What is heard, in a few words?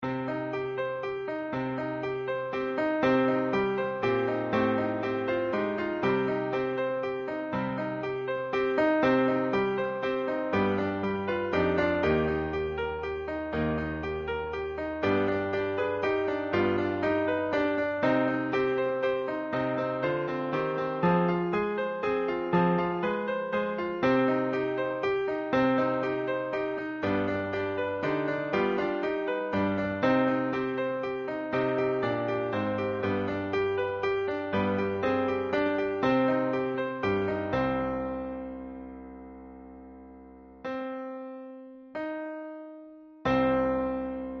klezmer